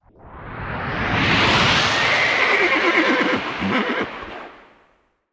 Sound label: spirit form; weapons; dota; keeper